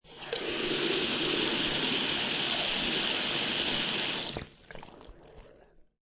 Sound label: Bathroom Water Tap; Lynne Music